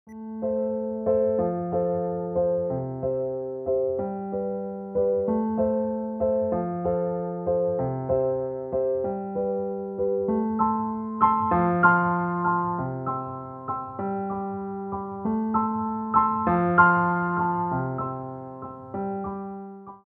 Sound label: PIANO